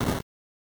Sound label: Land; Robot 64